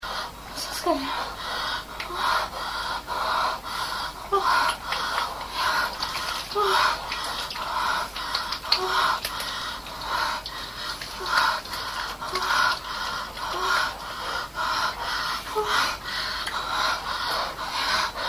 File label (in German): spritzen